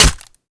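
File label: melee character gun small steel; viper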